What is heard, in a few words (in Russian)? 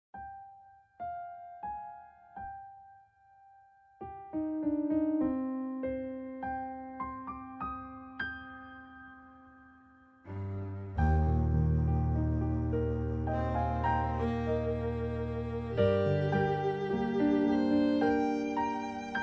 tragicheskaya i grustnaya muzyka